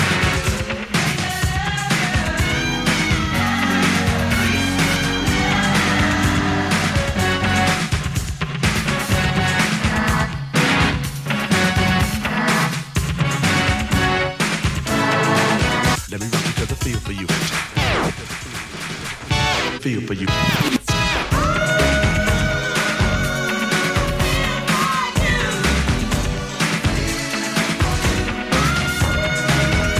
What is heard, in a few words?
12 Inch Dance 80s Groove